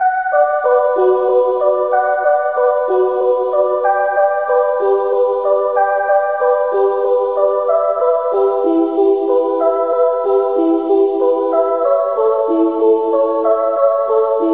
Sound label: WALTZ IT